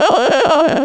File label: anger strong; VIVAE